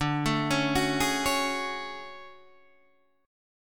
D Major Sharp 11th, Guitar, Open D

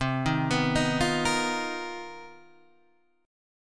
B Major 7th Flat 5th C; arpeggiated; BM7b5/C; JGuitar

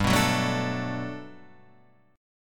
G Major 9th, G, down

